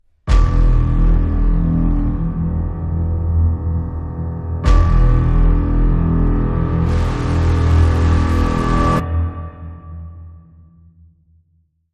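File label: Crime Sting, No Percussion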